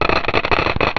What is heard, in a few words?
tick